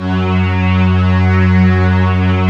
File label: Prophet Five; E-MU Formula 4000 Series Vol. 3 – Analog Odyssey